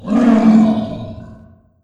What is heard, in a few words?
roar